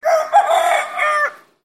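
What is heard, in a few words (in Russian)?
крик петуха короткий звук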